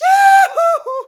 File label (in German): "JUCHUHU", Alpine Volksmusik; Best Service